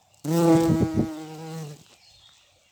Bourdons décrescendo; Vols Bourdn abeilles

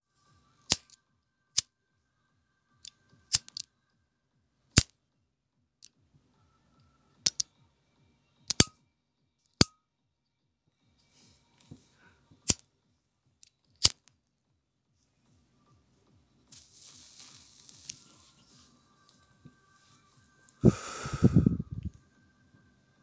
bruitages briquet allumage

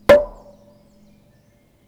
Gourdin buche Tum resonnance terrible; Percu Bois

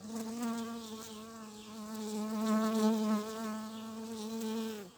Abeille long; Vols Bourdn abeilles

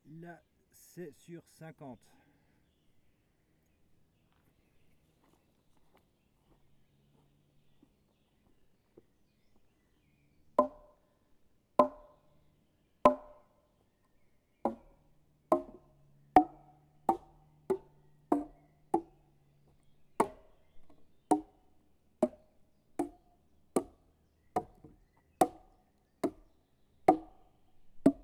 Gourdin Bûches séries multiples; Percu Bois

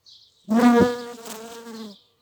Abeille qui passe fort; Vols Bourdn abeilles

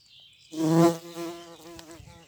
Bourdons; Vols Bourdn abeilles

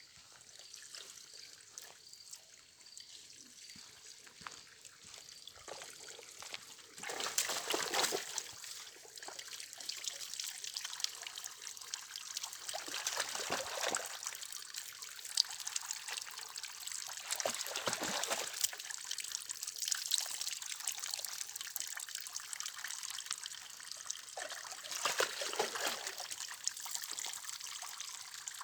Coulis de l'eau sous fontaine fin aigu constant; Bruitages; Eaux

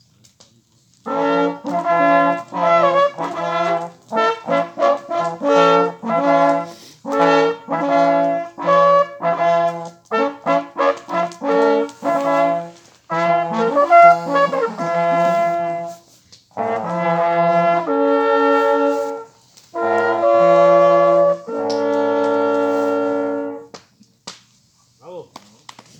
Hommage De Chasse Sonnerie; Societe de Chasse de l.Estenbach

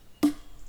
Baton Coup Creu, Percu Bois

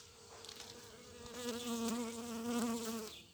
Abeille Vol Faible; Vols Bourdn abeilles

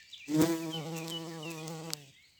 Vol de bourdon; Vols Bourdn abeilles

